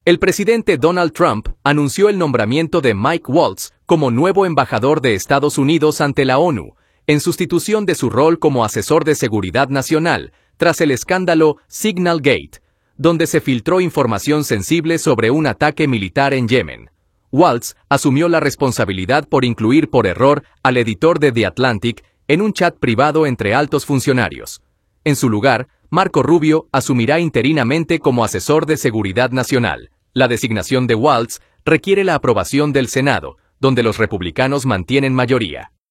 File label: waltz